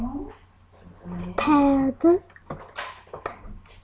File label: pad